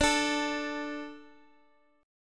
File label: D 5th, Guitar Drop D